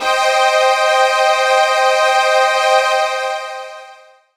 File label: pad, Dance Synths